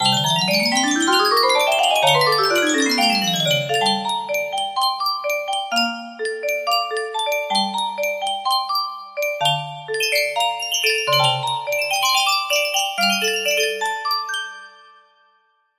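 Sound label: jazz, music box melody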